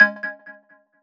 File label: new Ding